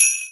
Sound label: sleigh bells; xmas; Garry's Mod; tf2